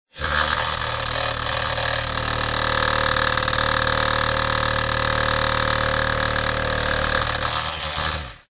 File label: industrial power tool vibrate grind metal motor hum